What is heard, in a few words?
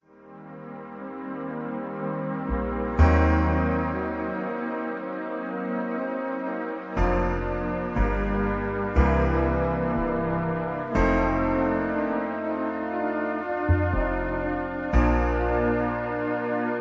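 Key Bb, Karaoke Track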